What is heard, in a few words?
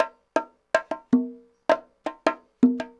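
congas bongos 80 bpm, latin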